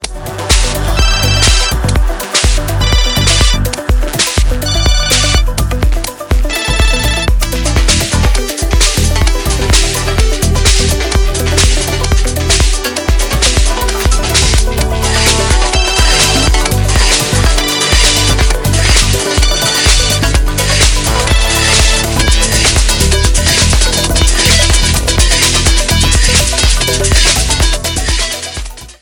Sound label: Samsung A35 Official Ringtone